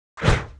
swing, orc lord; monster

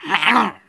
small attack

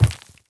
walk middle

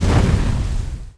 magma crystal attack; monster

